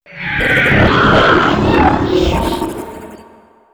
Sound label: advisor scream; hl2 ep2